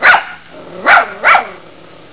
dog barking

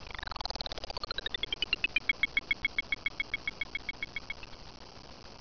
CLICK; Talking E-Mail

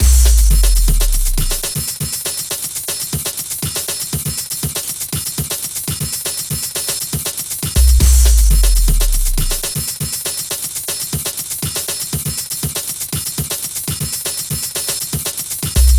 DRUMS, 120 BPM

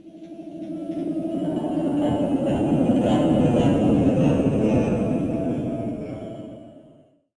military land; PC Gamer IT CD 47; Centauri; alien-x-fire-demo

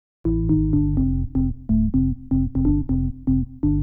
ORGAN HOUSE 125 A; eJay; Keys

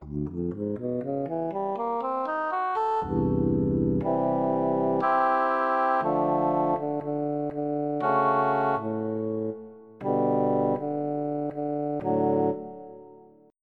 Oboe

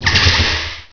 net open; TNPDEMO

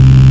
vul loop; Falcon4

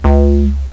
FM 202 Bass; MC202; 0.08 seconds; Amiga 8-bit Sampled Voice

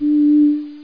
Flute D; RenderOrgan

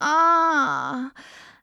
groan

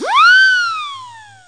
ring whsl, midi; cubic

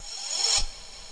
recycle

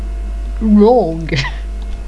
Wrong; Irrégularévision

